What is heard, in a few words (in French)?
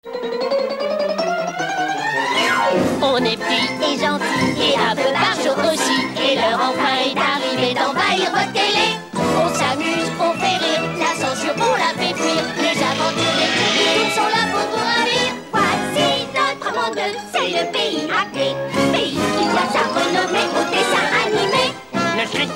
dessin anime (french); tiny toons; dessins animés